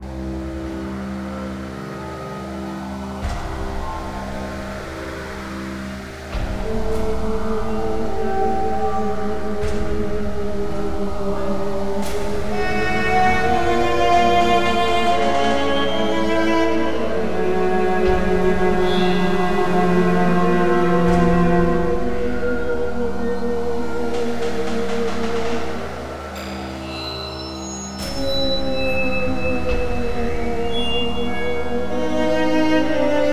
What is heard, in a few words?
Ambience; Pony Music Archive